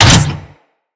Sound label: Jackal Step Metal, Machines; Steps